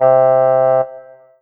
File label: Korg TR Rack Bassoon C3